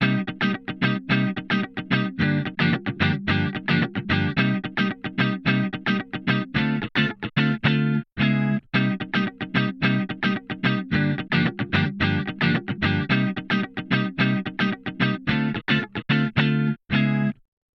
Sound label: Guitar bypass; Freqport